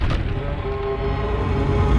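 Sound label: Music Volcano 120; from dust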